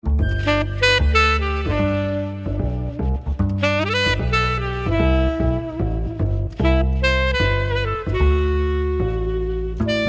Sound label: Jingle Jazz